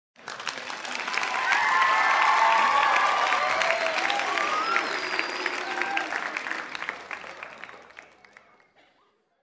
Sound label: Applause and Cheers